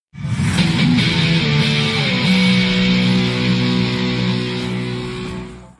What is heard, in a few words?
mission passed; trevor philips